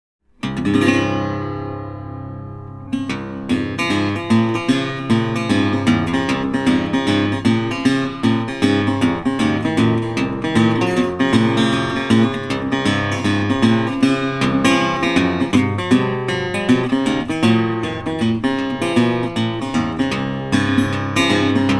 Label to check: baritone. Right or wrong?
Right